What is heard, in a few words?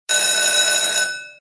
RING SHORT; Just Jewels